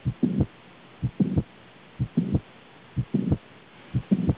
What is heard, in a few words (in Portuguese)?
prolapso mitral, sons cardiacos